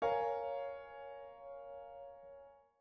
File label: Bbmin7